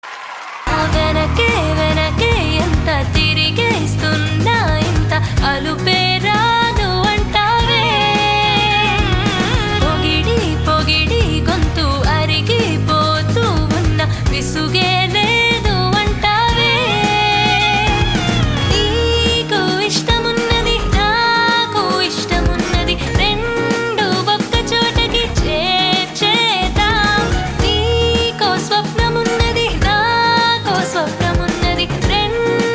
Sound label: Song Female Version